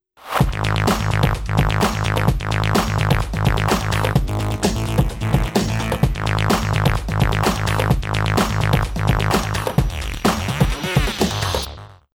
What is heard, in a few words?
bomb ten sec count